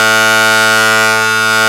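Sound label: synth guitar synth; Best Service Gigapack 1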